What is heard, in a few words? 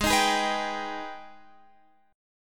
G sharp Minor Major 7th G sharp down